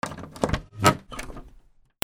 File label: DOORS